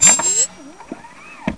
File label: SOUND JACK UP